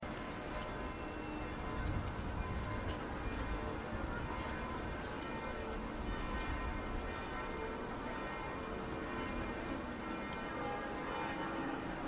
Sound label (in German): glocken des ulmers muensters